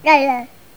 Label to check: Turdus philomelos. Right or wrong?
Wrong